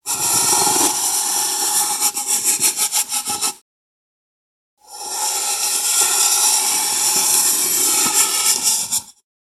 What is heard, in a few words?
steel wool s.o.s on a pan fast